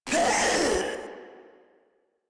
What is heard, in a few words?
Proto Infested pain; Warframe; InfestedNinetyNine